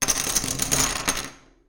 Poker Chips Large Stack Of Chips Drop On Wood Table